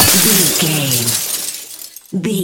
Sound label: glass smashable large break